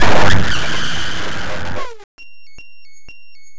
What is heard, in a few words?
DISTO FX; Big Beat Samples & More; AKAI; DISTOFX KIT